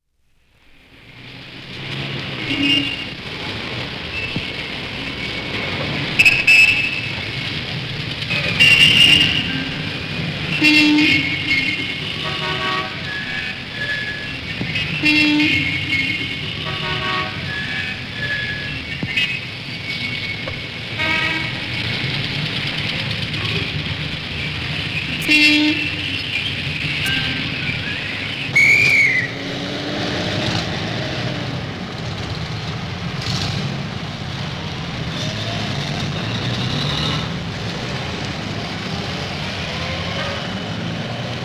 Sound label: Traffic